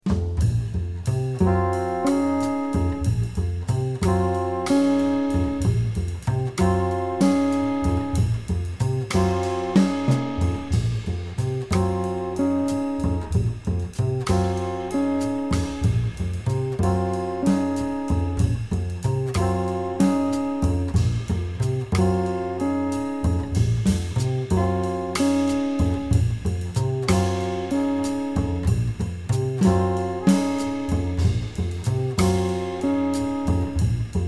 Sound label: minus melody